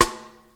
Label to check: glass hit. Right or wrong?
Wrong